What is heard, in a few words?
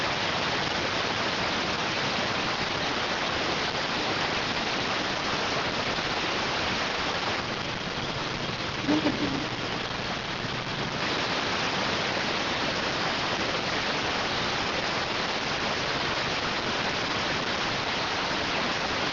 rtty rx